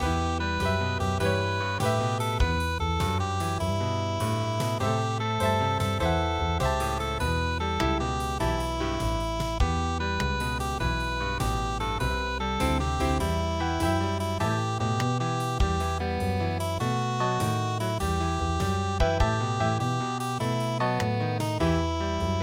harmonica blues; Sometimes I Feel Like Motherless

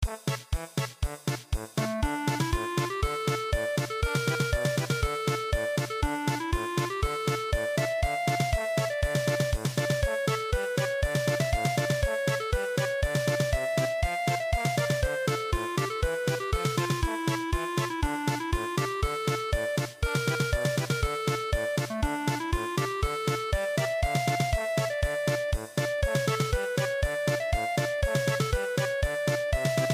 German Polka, midi; Oh Charlie is My Darling

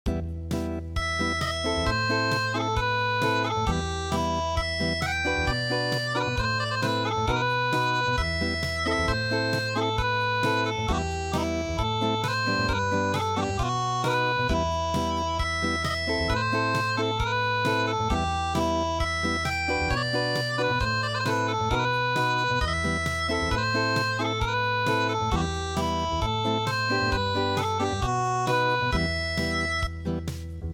Acoustic, traditional

